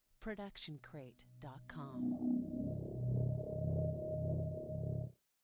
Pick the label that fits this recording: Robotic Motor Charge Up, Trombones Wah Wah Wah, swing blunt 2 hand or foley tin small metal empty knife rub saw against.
Robotic Motor Charge Up